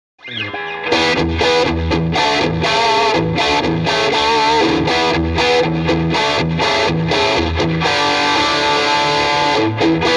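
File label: m75 tele bridge pickup distortion jtm45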